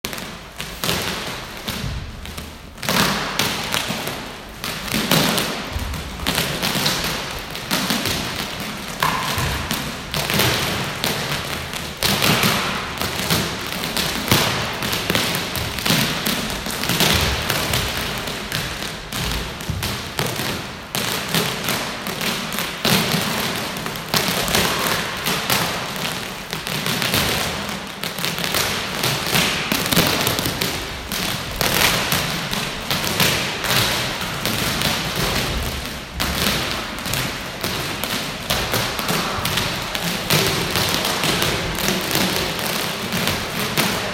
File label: Black cables whipping